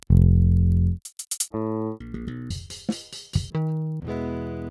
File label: Pultec; Virtual Analog